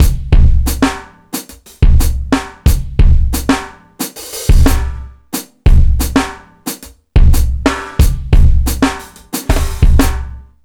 090bpm, Downbeat Dance Grooves

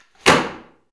CUFF, Anime Sound FX; AKAI Anime Sound FX

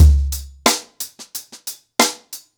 Ripple Effect 90BPM, acoustic drums

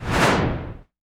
cinematic impact wipe

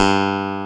Clavinet G2; USB Soundscan vol.09; AKAI; Keyboards Old School

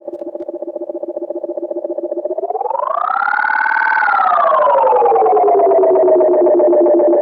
Tape Delay Feedback; radiosonics

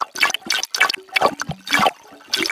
RITHM D O L, RYTHME; USB Soundscan vol.33; AKAI; Disjointed Textures

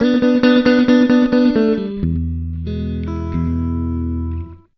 Blues, Electric & Acoustic Guitar Loops; USB Soundscan vol.04; AKAI

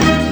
HIT, Hits & Brass

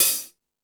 Stadium Rox, Drum Machines, E-MU Pro-Cussion

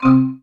airimba C4; Greytsounds; Scott Peers Sound Engineering

